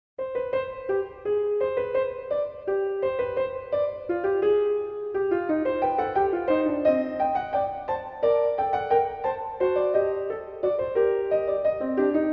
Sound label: Fugue II, c-moll, Well-Tempered Clavier; J.S. Bach; BWV 847